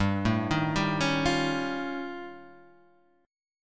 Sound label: D sharp 9th Flat 5th G, guitar